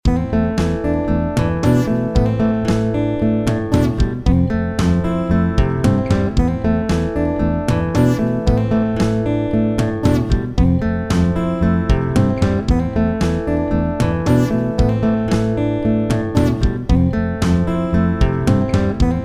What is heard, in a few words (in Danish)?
Simpelt guitarspil